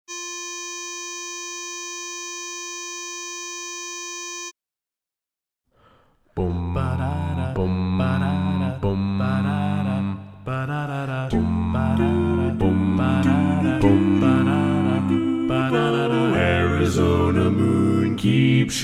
Bass Left

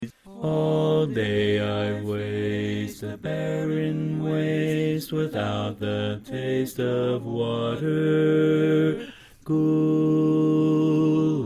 Bass

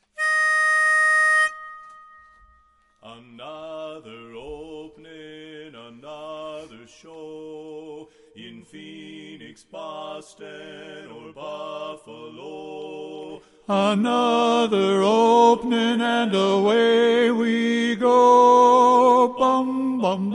Lead

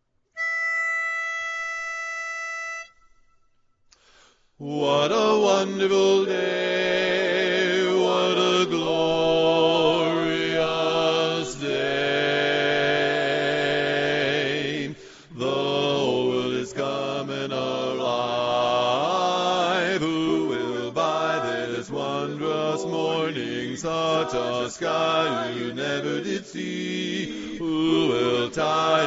Bass

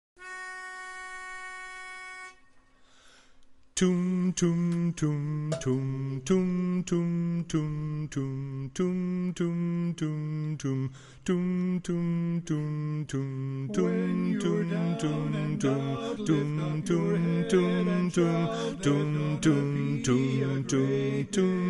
Bass

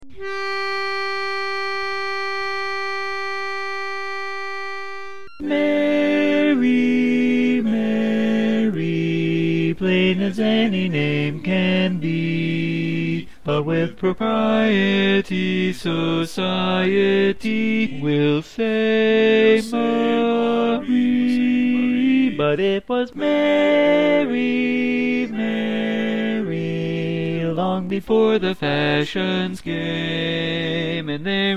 Lead

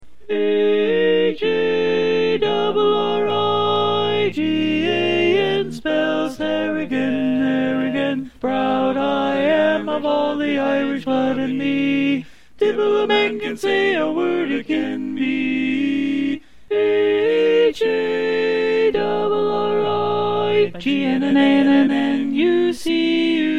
Tenor

